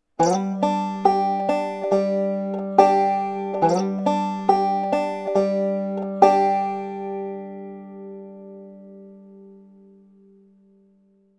slide thumb lead style

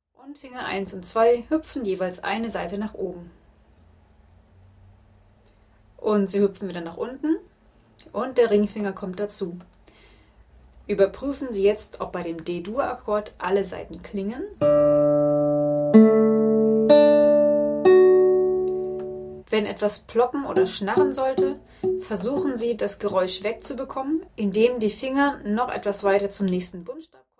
akkordwechsel d a7

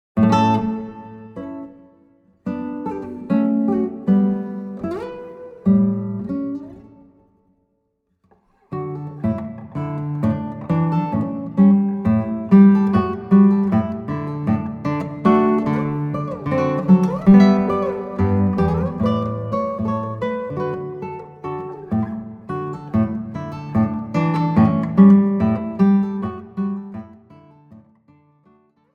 polka

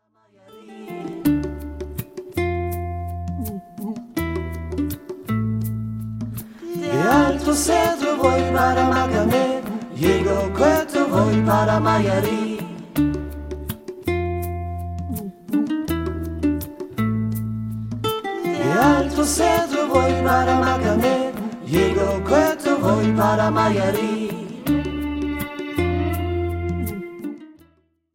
ohne ukulele

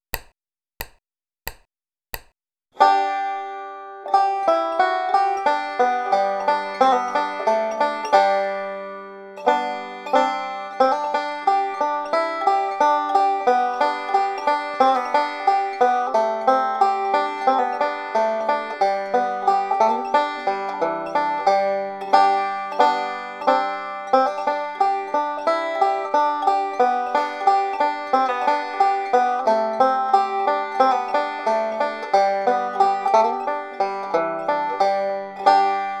wildwood flower mit hammer on pull off slide und licks

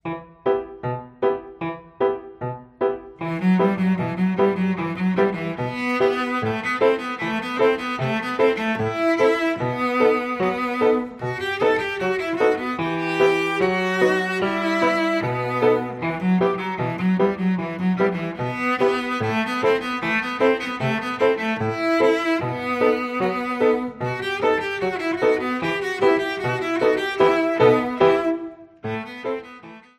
ungarischer tanz

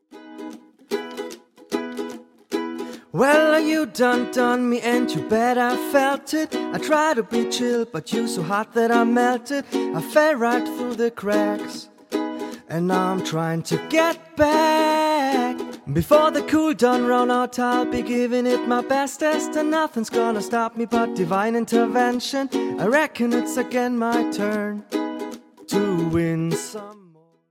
ukulele gesang

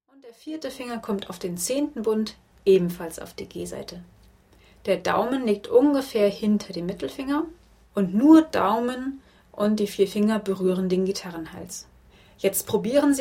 die linke hand

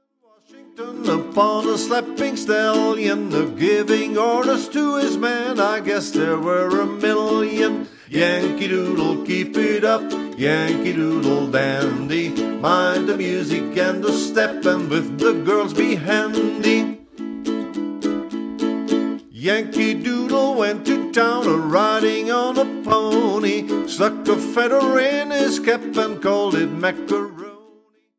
yankee doodle ukulele gesang

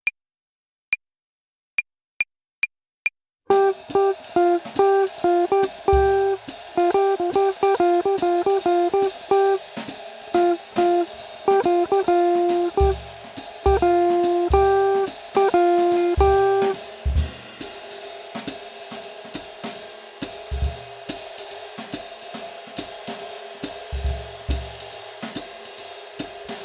swing phrasierung uebung play along

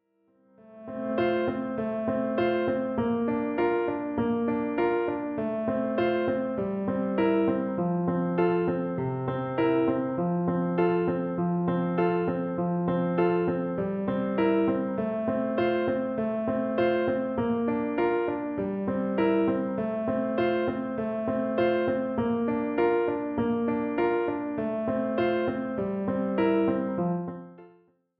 der kreisel F dur

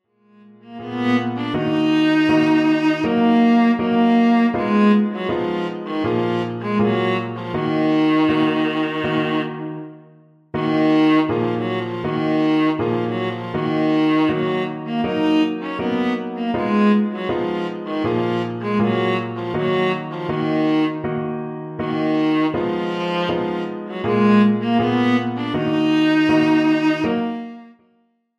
trauermarsch

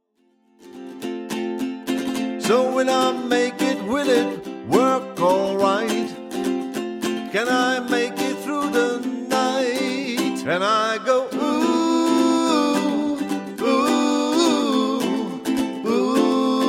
flying ukulele gesang

